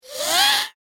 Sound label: Wizard Pet Flying Familiar; Magic Sound Effects; fantasy sound effect